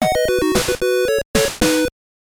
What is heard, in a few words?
Stage Clear; Super Smash Bros. Ultimate; Balloon Fight